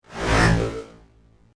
Whoosh SciFi Saber Slash; Scifi Science Fiction; Light Sabers

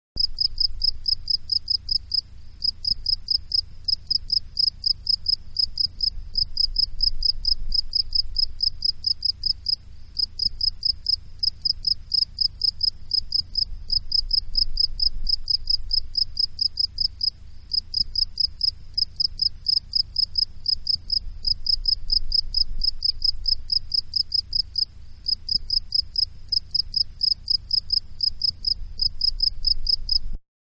Cricket-Single-Very Close Up; Insects; Animals; Manhattan Production Music

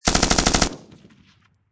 Machine Gun M249 Long Burst Close Perspective; Lynne Music

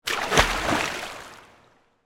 Pool Indoor Splash, Water; Pools; Backgrounds; BlastWave

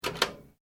Switch Light Pull Cord Activate; Various switches; Lights; Household

